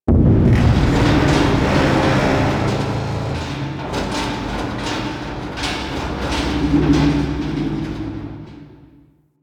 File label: Cargo Train Exit Ship Rumble A; Warframe; Corpus Railjack